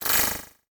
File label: Multimedia Internet CD-Rom Flash Animation